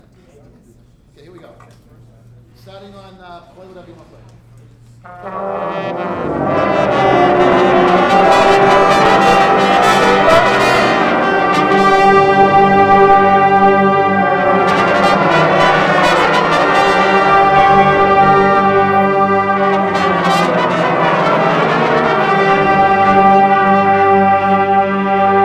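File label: Chord Progression Run Through, brass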